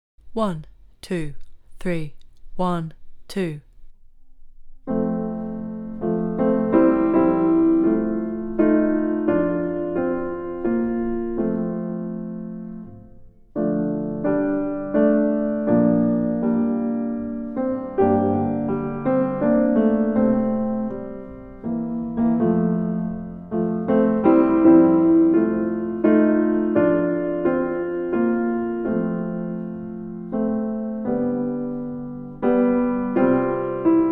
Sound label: Nocturne backing; a Midsummer Night's Dream